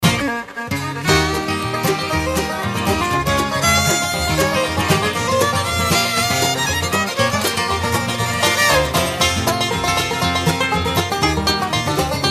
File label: solo, banjo